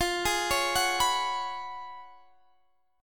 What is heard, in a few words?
F sharp Major 7th Suspended 2nd Suspended 4th, F